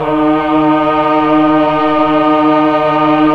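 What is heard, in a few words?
Pad Tron Violin & Choir D#4, Slow Str+Vox; Keyboards of The 60's and 70's; Melo. Str+Vox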